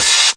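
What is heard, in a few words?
Yamaha RS7000 hi-hat cymbal F1; drum machine; Yamaha RS7000 Cymbals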